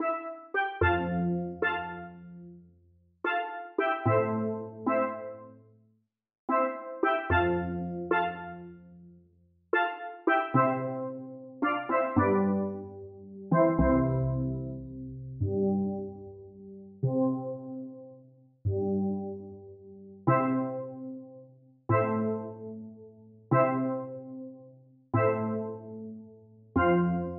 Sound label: Steel Pans; Alleluia